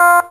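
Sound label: synthesizer Dance; Best Service Gigapack 1; SYN 06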